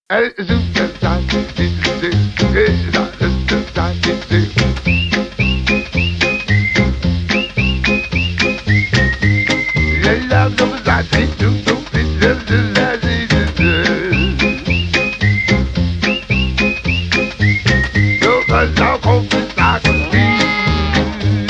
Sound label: Whistle Swing